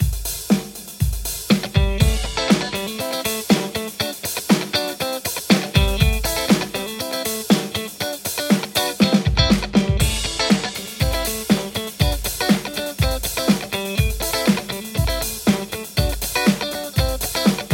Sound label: strat th3; overloud